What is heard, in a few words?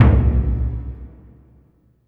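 Burundi drum, Heart of Africa; Spectrasonics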